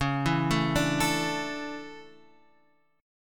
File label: D Major 7th Suspended 2nd